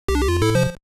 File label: checkpoint; Jay The Doggo